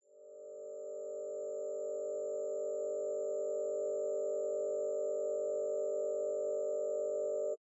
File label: Noise as Electric Motor; TINNICA